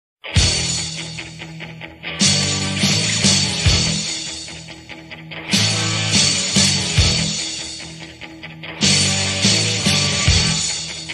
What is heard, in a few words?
theme song; Ultimate Warrior WWE